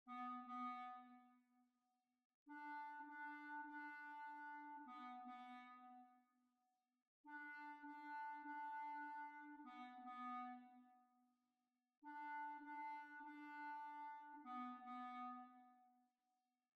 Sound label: Jig; Mr Richardsons Jig; B flat